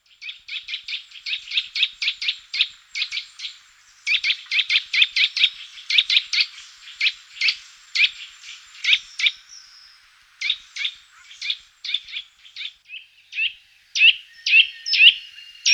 kleiber, gesang; singvoegel; roche 2004

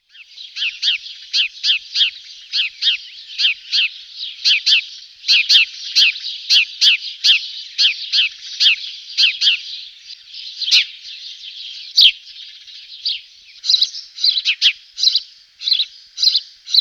haussperling, gesang; singvoegel; roche 2004

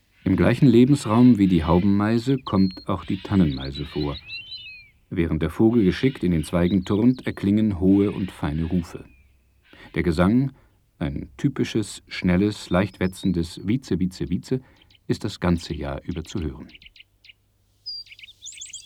tannenmeise, gesang; singvoegel; kosmos 93

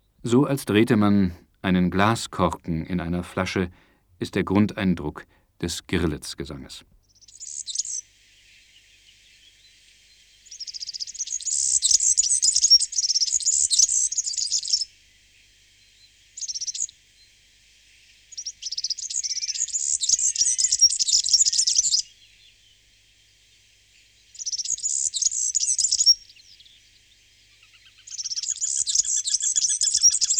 girlitz, gesang; singvoegel; kosmos 93

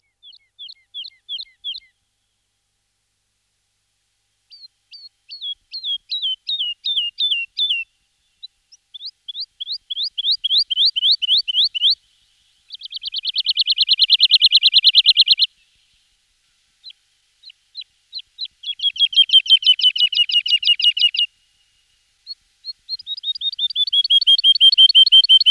heidelerche; singvoegel; roche 2004

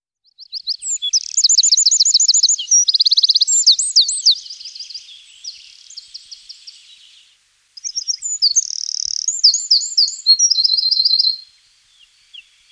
zaunkoenig, gesang; singvoegel; roche 2004

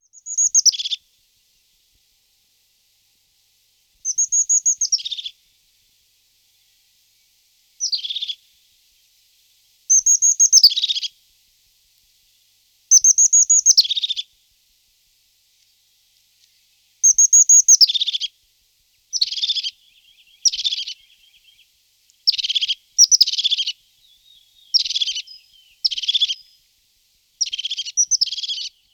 haubenmeise, gesang; singvoegel; roche 2004

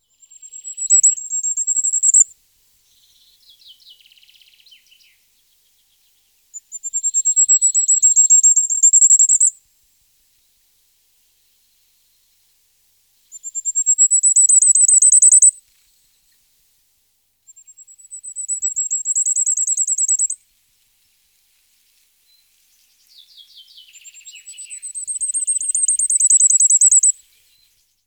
sommergoldhaehnchen, gesang; singvoegel; roche 2004

